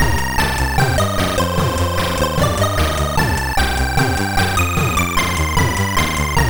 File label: sid MOS6581R3